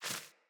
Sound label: Sparrow Quick Move; Animals